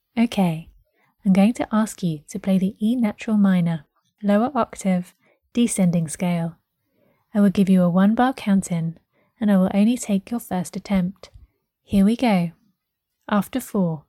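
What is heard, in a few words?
e natural minor lower descending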